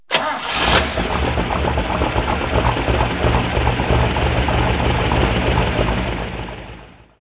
hotrod; citylife; autos

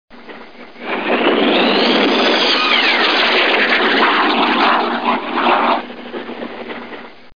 rocket; How Many Bugs in a Box; juicebar

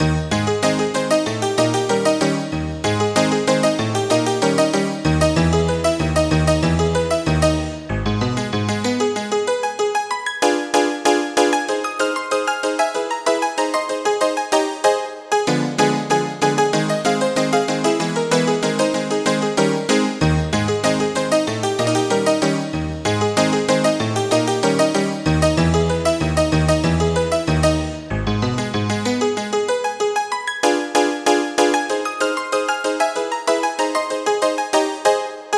maple rag

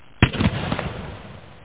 cannon fire

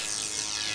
ELEC LOOP; lander

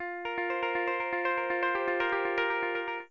Relaxed, ringtone

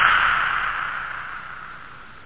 cave; CursedKingdoms

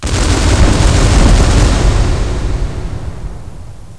FIRE

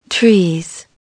trees

